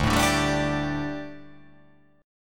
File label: D Suspended 4th D, down